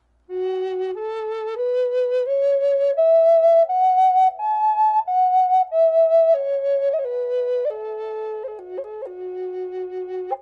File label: Redwood Flute FSharp; standard flutes